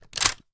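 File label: shotgun reload empty start open; reloads; iw8; romeo870; gmod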